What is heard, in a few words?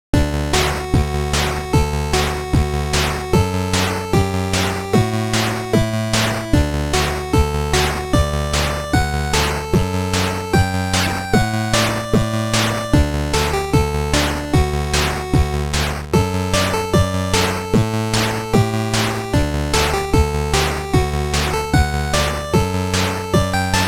Win Game; project blue original soundtrack